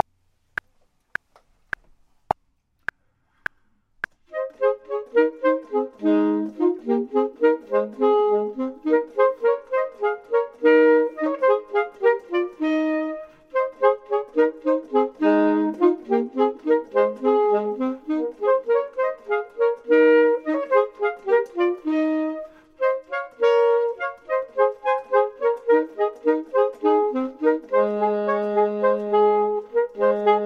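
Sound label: both, Saxophone and Clarinet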